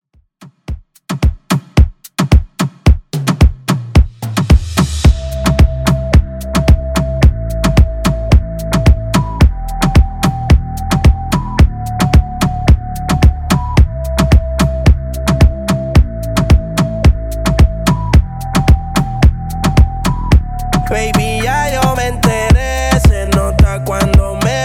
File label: Top40 Reggaeton 110bpm CLEAN